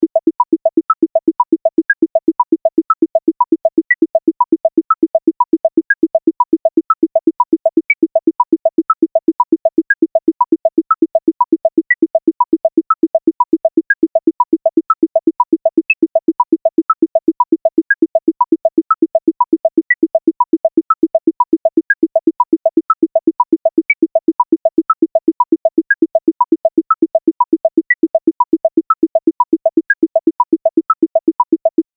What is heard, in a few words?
Binary Clicker